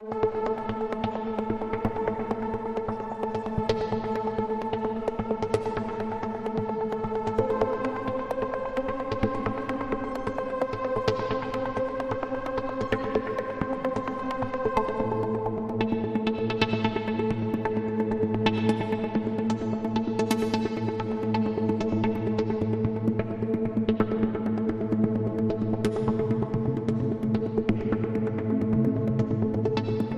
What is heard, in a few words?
Drone, Light